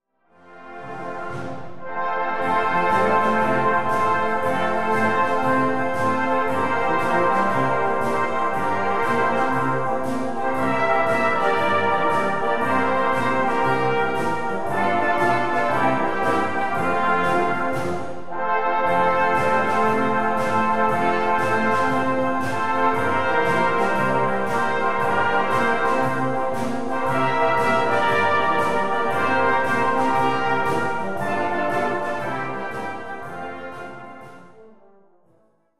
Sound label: christmas swingalong, brass band